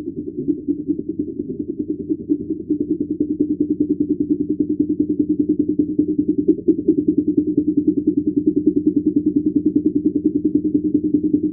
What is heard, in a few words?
Greenland pole noise